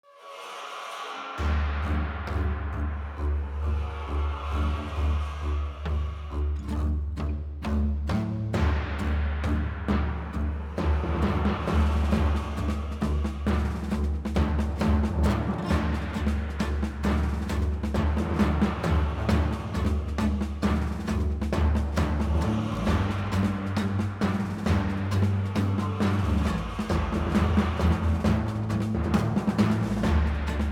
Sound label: BGM Rescue Ship; PAPER MARIO Color Splash; wiiu